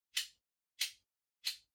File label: Lighter (Far-Flick)